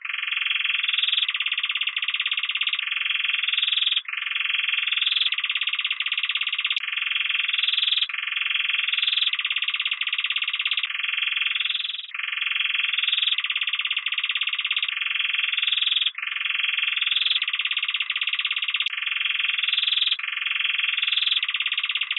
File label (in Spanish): Tibre Intemedio, timbrado español; θετικές νότες